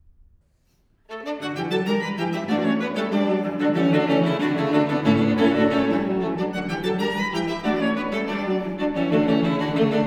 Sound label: String Quartet Molto allegro; No.2 in D KV.155